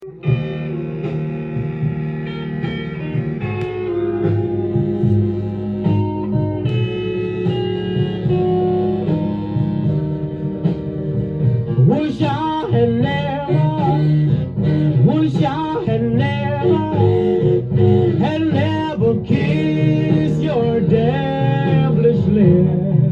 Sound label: Blues